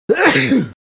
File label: Sneeze; Sportware Golf